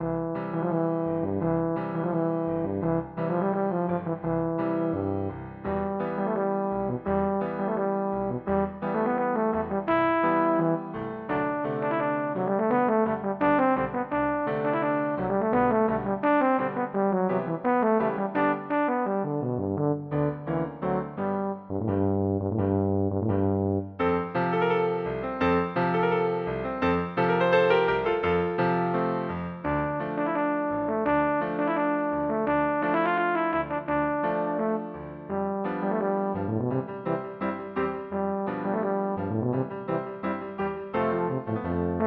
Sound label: violin, euphonium